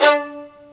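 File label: MARC VLN A2, violin; AKAI S-Series CD-ROM Sound Library VOL-2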